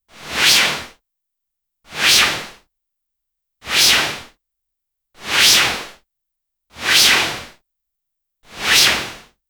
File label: a short clean and light, puzzle